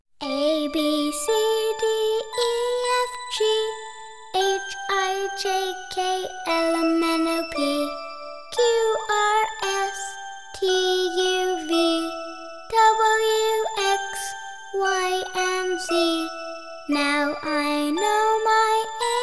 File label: Traditional ABC Song